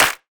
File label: House Clap; Drums; One Shots; Claps